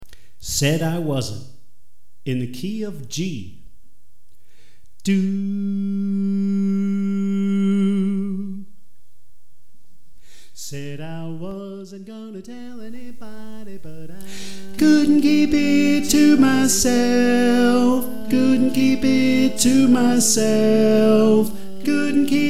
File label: Tenor